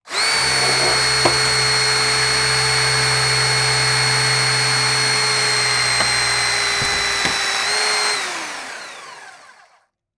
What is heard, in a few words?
Metal Destruction, Jaws Of Life; Secret Source; DPA4061